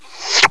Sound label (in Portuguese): lambida; 7invasores